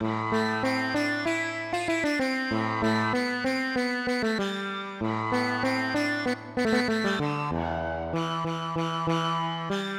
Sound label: Sitar A, Hip Hop Exotica, 096 Amin; Apple Loops; Big Fish Audio